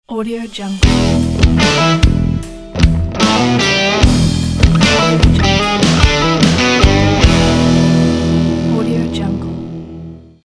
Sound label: blues logo